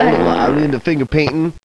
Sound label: fart